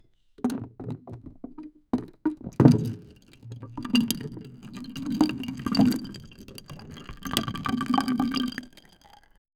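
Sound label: heavy sloshing of thick liquid, barrel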